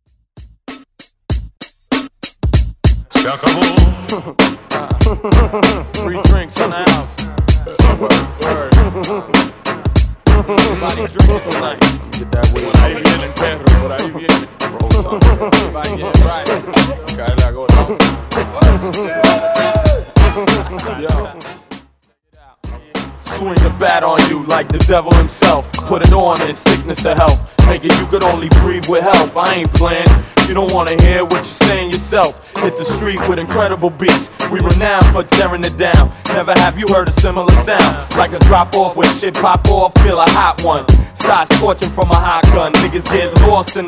Hiphop Redrum

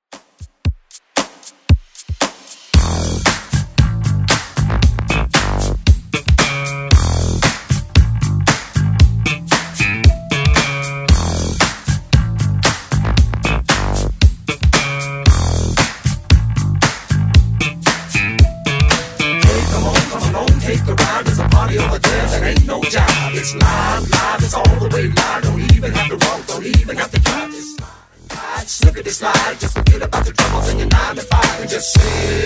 80s DJ Friendly Redrum 115bpm

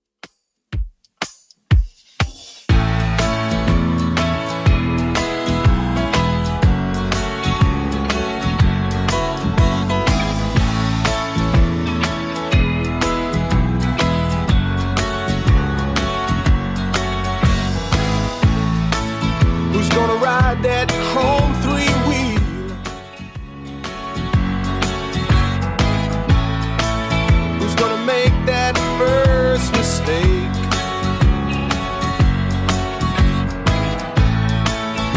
80s Redrum 122bpm

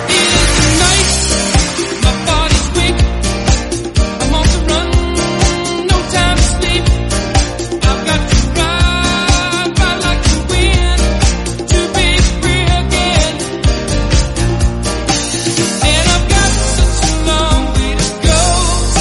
Yacht Rock 124bpm Gm

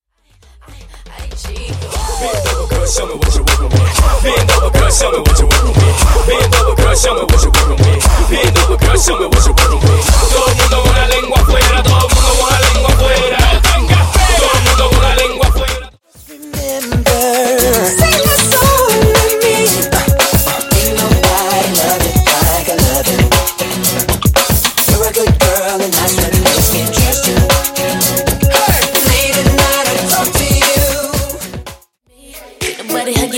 HipHop vs RB Mixes 118-96 Bpm

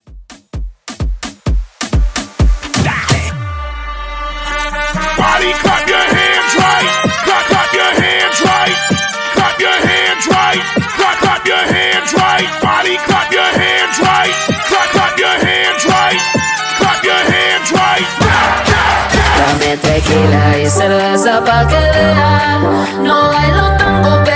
Rumba Trompeta Hype 129 BPM; Mash up; ReBuild

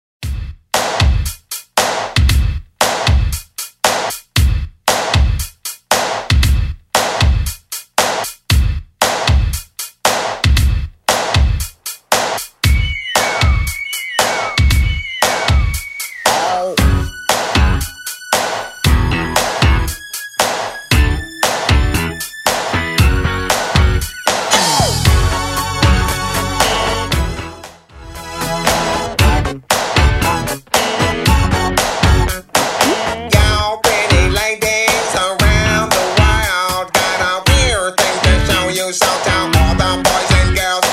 80s Extend Redrum 116bpm

